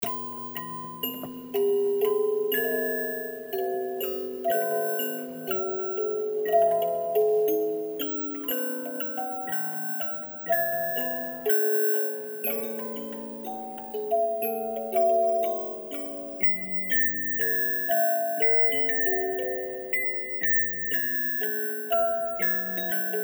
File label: Chime; Disneyland Hotel